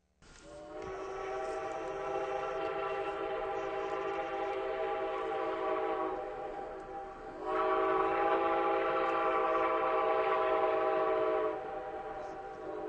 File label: steam leave station long